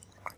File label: Sex Slide; Lewd SFX